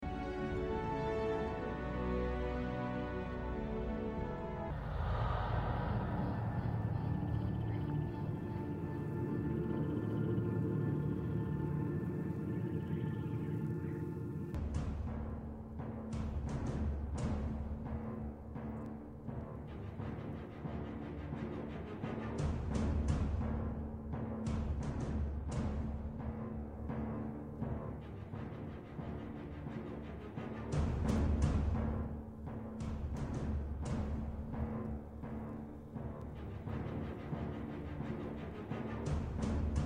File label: Music